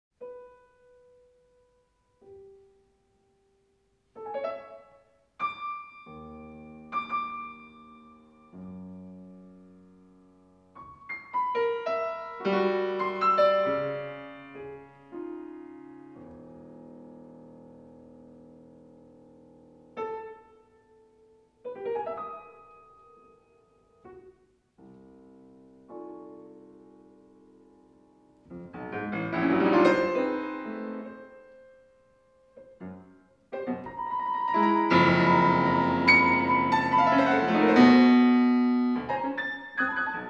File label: Piano